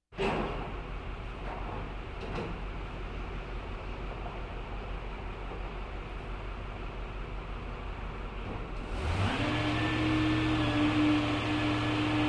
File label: Noisy machinery in workshop